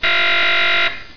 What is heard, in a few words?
BUZZER